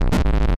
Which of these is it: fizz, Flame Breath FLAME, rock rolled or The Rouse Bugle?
rock rolled